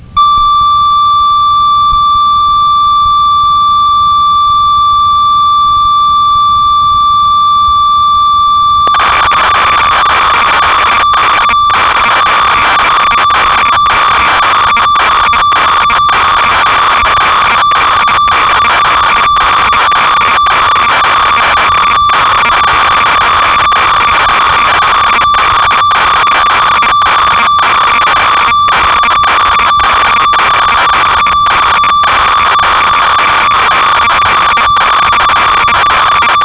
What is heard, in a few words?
ob6, synth